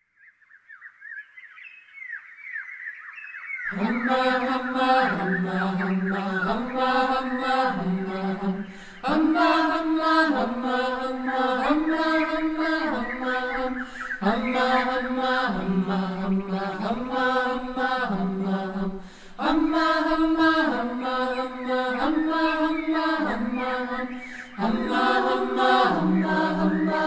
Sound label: hummingbird chant